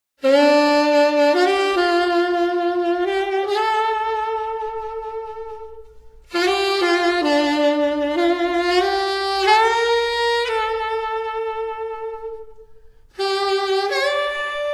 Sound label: sax; ss13